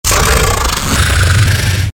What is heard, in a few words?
danger growl